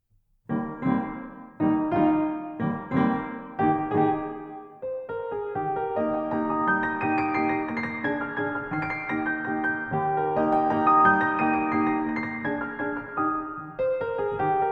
Valzer pianoforte; von Carl Maria von Bocklet

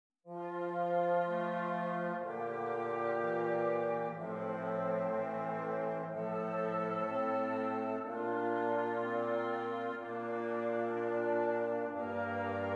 Esercizi di contrappunto a tre voci; Zweite Gattung; Ronge pagina 62; corretto

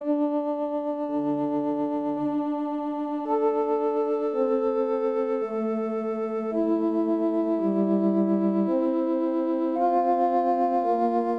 Esercizi di contrappunto a due voci; zur Viertes Gattung; Ronge pagina 66

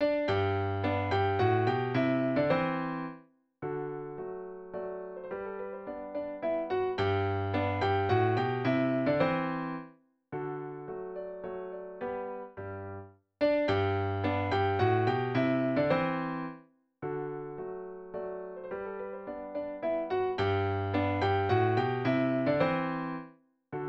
Minuetto in sol maggiore; versione Graziano Denini

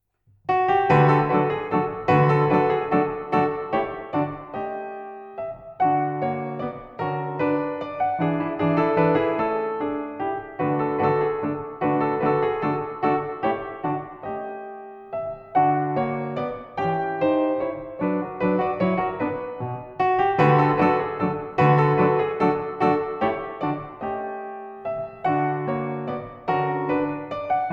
Valzer pianoforte; von Lapellmeister Franz Volkert

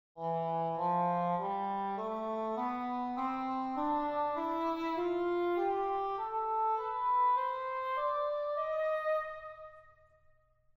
Contralto

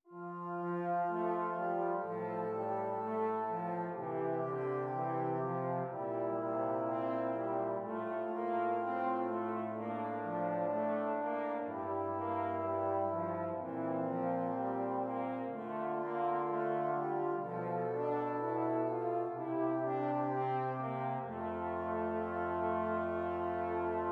esercizi di contrappunto semplice A tre voci

